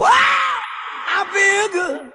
feels good

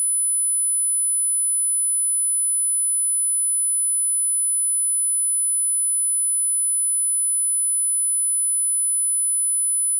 10000 khz

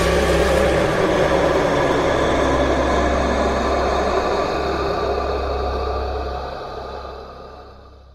scare; scp-173

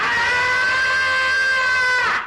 scream ahhh; tom

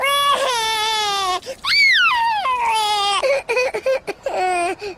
crying

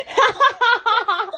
laughs

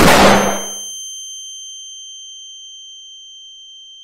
flash bang

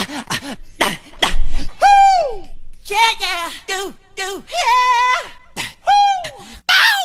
vocal

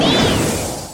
smoke clone; naruto

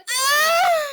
anime ahhhh

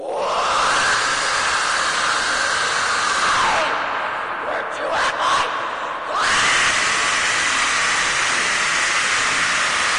scream; mrs pomp

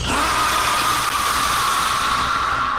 yell; lethal ape gus

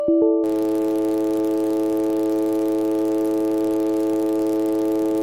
windows 10 bsod sound

